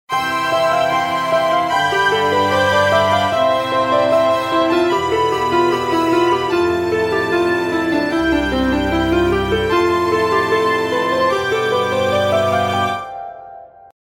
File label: strings